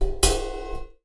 Cymbal; Percussion Samples

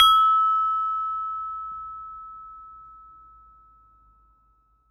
Saron P, Gamelan

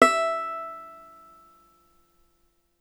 Violin Extended Technique

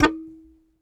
Very Short Note, Melodica

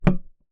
Key Noise, Melodica

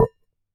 Damped Hit, Glass, Large Wine Glass

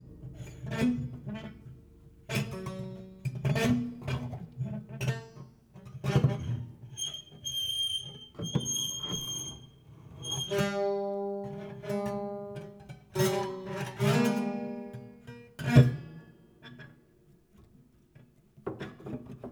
Plier Pluck, Piano Destruction

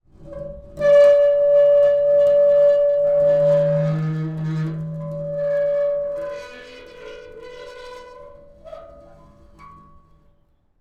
Pane Of Glass Rub

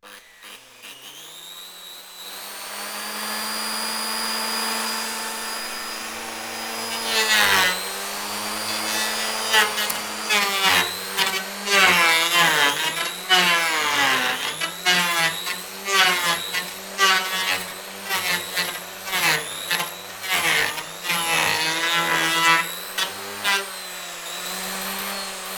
Planer, Workshops

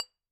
Bottle Struck

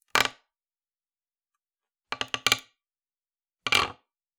Palette, WoodenPalette

